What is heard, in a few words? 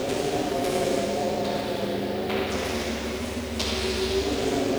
Cracklature, sparse soundscape